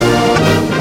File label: March